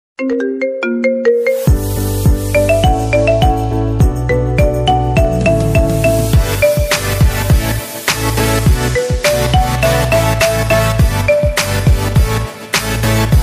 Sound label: Marimba